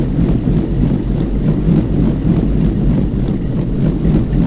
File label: Village Windmill loop; moul